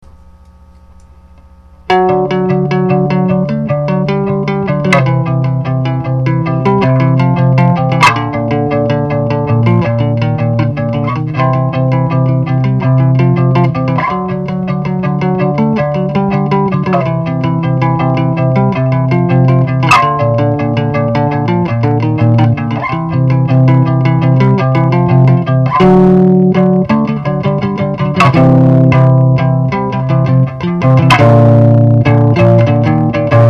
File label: bass melody